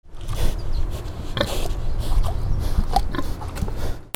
ANIMALS

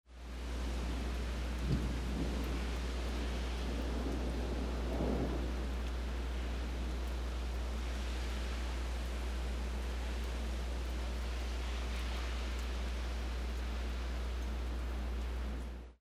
RAIN

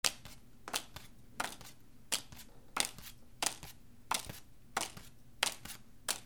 CASINO

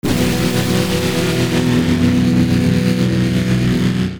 MUSICAL

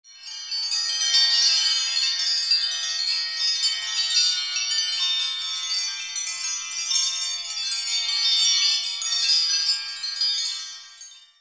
BELLS

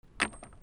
FALLING

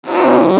TOYS

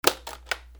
OFFICE

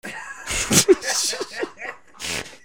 PEOPLE

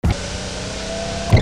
DOORS

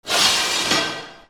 FRICTION

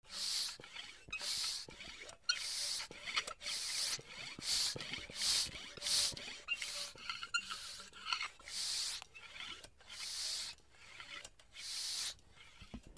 GARAGE

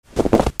FOOTFALL

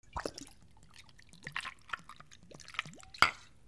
KITCHEN

